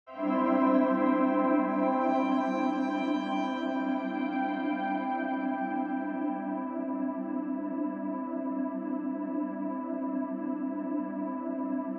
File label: ambient